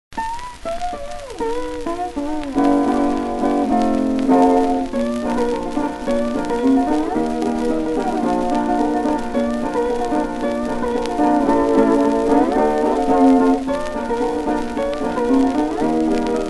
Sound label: laughing rag; 8 string Knutsen